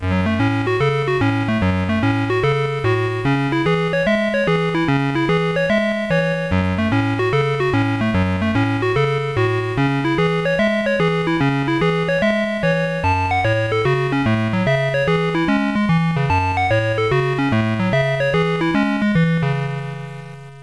tune

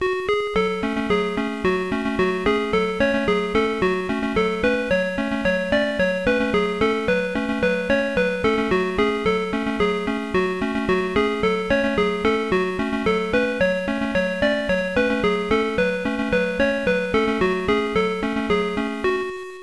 tune; Games.Rick Dangerous

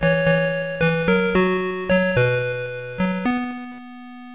gameover

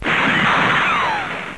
bullet; Games.Rick Dangerous

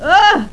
OOF; Carmageddon

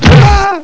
big hit; Carmageddon

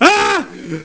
scared

